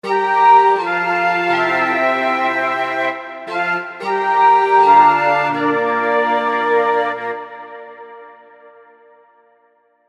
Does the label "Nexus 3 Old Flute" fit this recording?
Yes